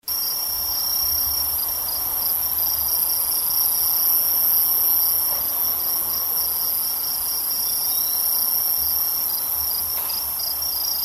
kinhibari

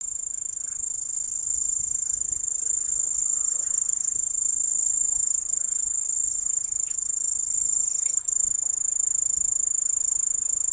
kusahibari

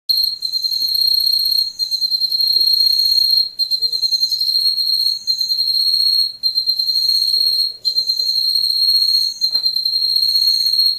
ryuukyuumatumushi; ヤブキリ

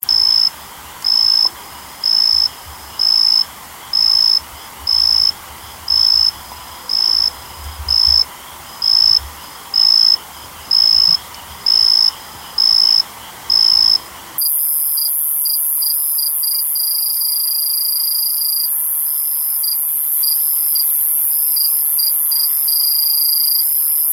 kayahibari kinhibari; ヤブキリ

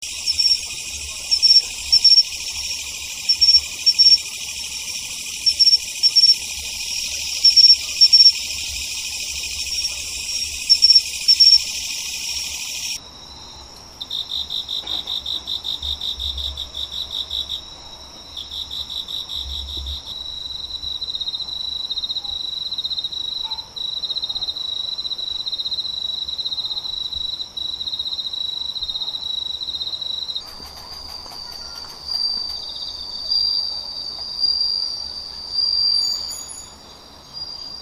matsutokutuwa; ヤブキリ

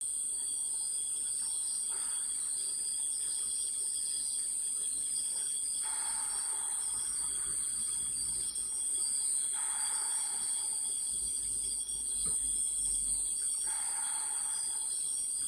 shibasuzu

